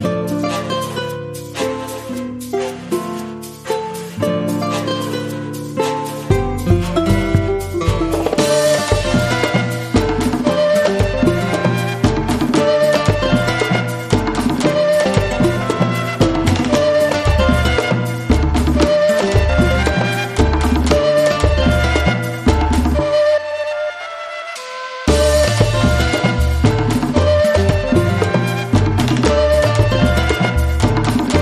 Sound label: Latin World Groove